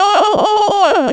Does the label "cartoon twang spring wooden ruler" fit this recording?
No